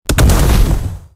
Explosion Bomb

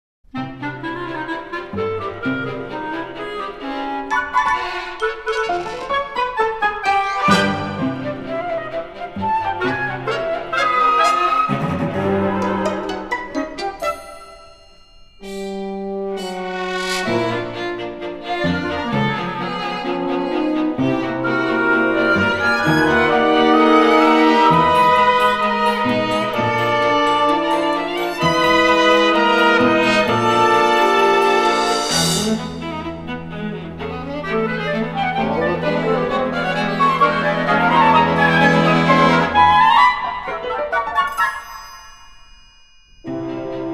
polka capriccioso for chamber orchestra

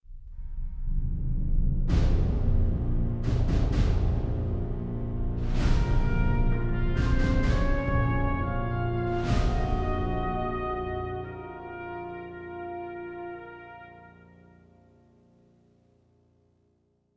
Death; tom clancy s ghost recon

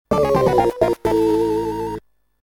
Communication VS Defeat

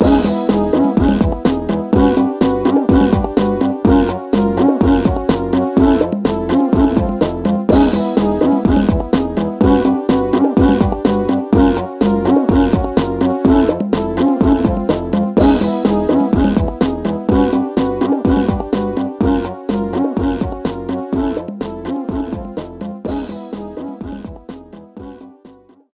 TV Commercial; Apple TV; animal crossing new leaf welcome amiibo soundtrack

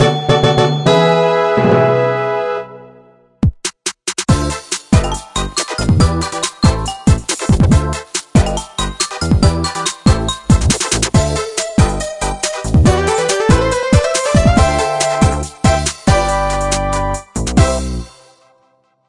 Bgm Build Complete

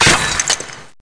break ceramic

